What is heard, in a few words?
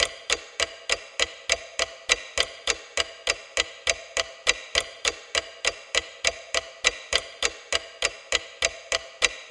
Clock Ticking; Umineko